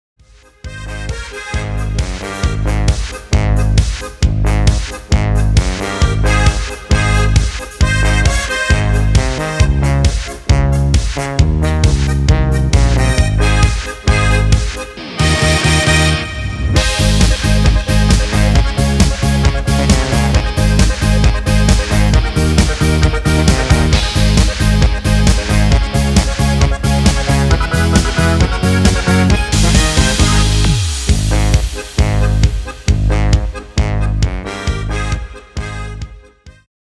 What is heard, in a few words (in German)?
Gis DUR ohne CHOR